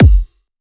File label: Electro Kicks